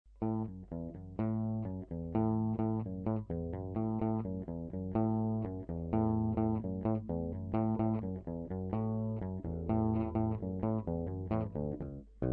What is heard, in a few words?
EB3, bass